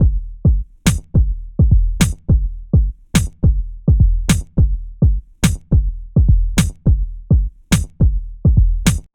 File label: 105 Twinky Bell, Breaks; loops; 105 BPM; Loopmasters; Jon Carter & Alex Blanco; Block Party Essentials